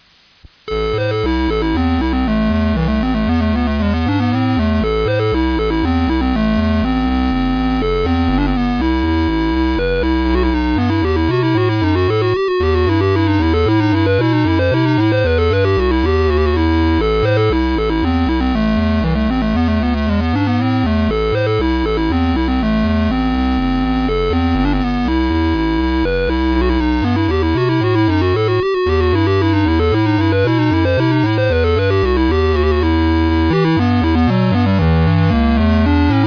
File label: Suite n° 2 en si mineur, Badinerie; J.S. Bach; BWV 1067; Mouvement 7